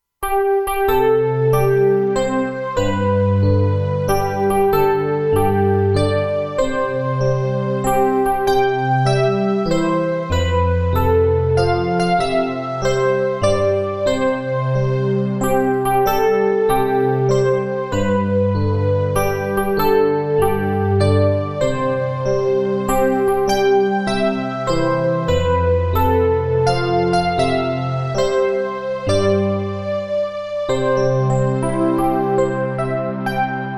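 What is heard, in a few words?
happy birthday to you минус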